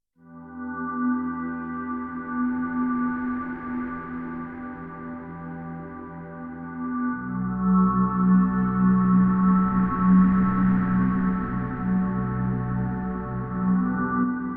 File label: Murmur